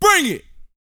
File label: VOX, Vocal, R&B Dance, HIP-HOP